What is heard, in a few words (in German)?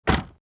Auto Heckklappe schliessen